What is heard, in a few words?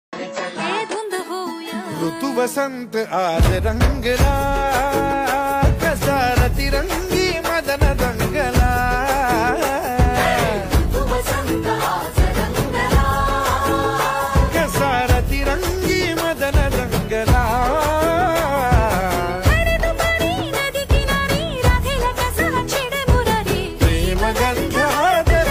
Ringtone